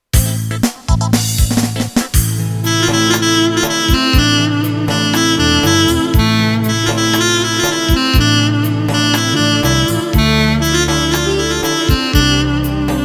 leyli leyli; korg pa80

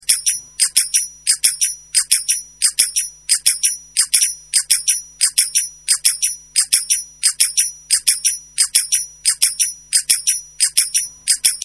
trakya zil; roland gw8 ritim

